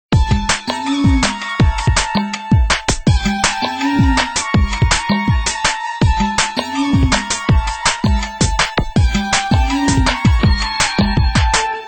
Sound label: Melody, RingTune